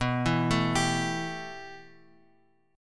B 7th Suspended 4th Sharp 5th B down Bass Standard; B7sus4#5 arpeggiated; chord shape; JGuitar